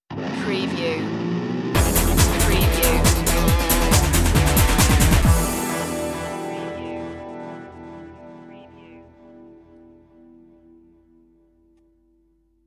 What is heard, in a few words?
accelerator stinger